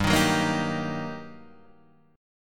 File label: G Minor Major 7th Sharp 5th, G, down, Guitar, Half Step Down